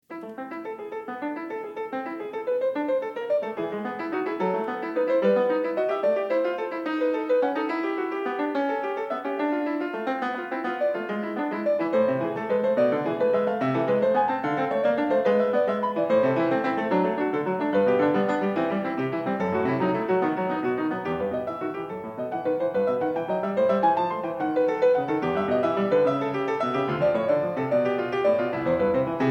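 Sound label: Gigue, piano; Partita 3 in a; BWV827; BACH; PARTITAS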